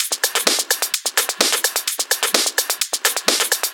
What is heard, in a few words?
House Loop, 128 BPM; Vengeance Samples